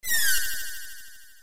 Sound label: zap; ff3; ff3us